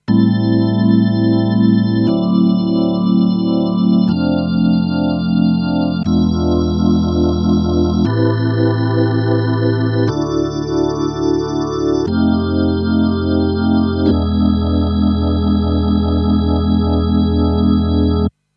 E Organ Neo Ventilator II

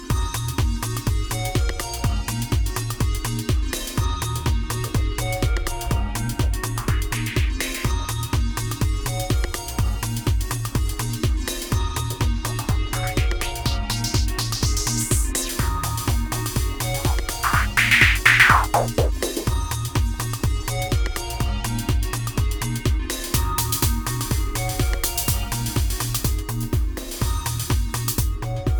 Sidechain remix

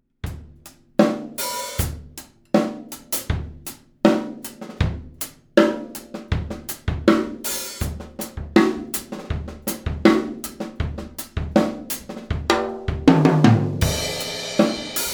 Steinberg UR12 AT4040 Drums

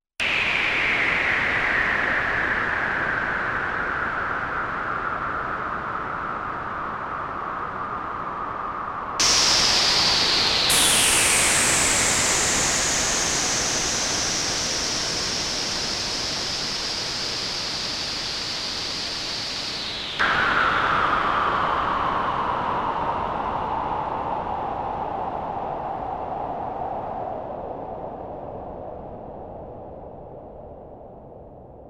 Falling Noise with Novation PEAK

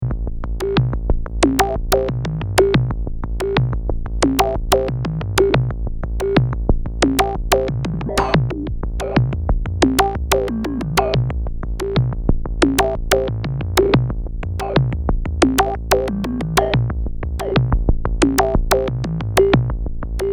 Reso Filter FM; sys100m

